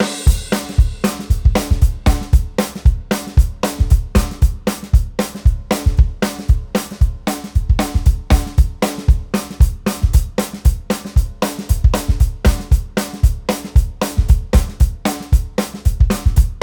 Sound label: 2-Beat (230 BPM)